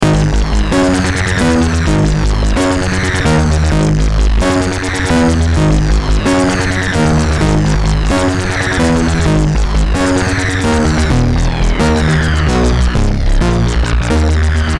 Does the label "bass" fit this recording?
Yes